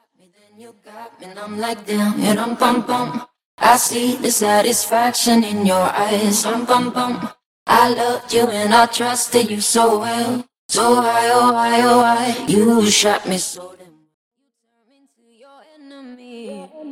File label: dry and wet